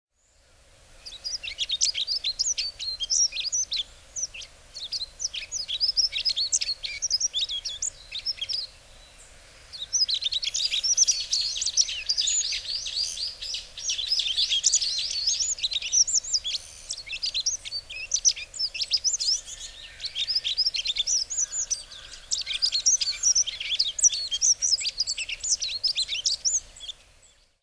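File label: yellow-throated bunting, hyogo; miyamahoojiro; koe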